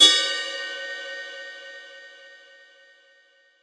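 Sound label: Yamaha RS7000 hi-hat cymbal Db6; Drum machines; Yamaha RS7000 Cymbals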